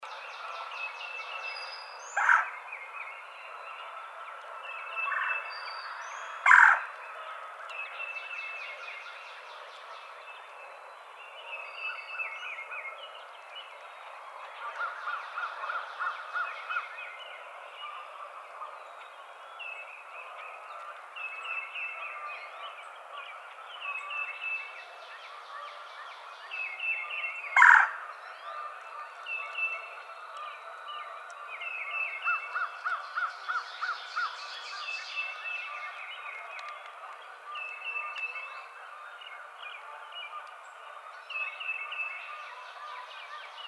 Red-bellied Woodpecker

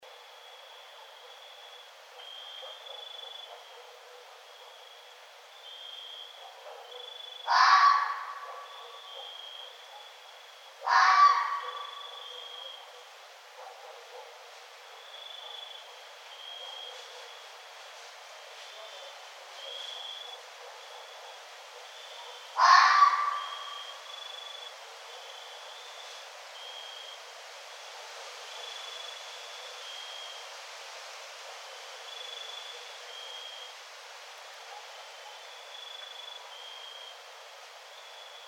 Red Fox

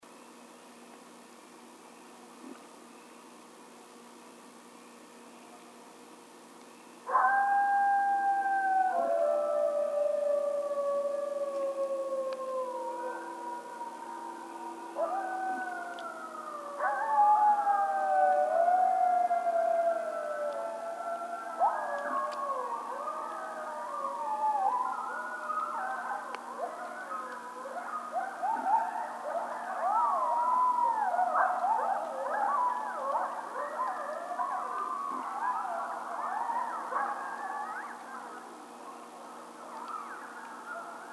howl, Coyote; Coyotes (Canis latrans)

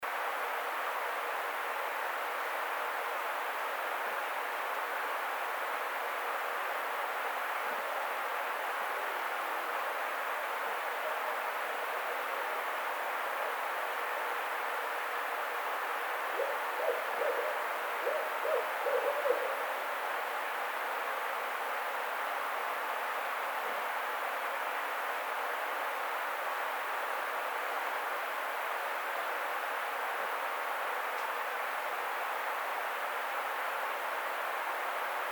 Barred Owl

